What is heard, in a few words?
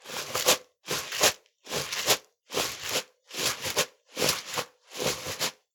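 rice washing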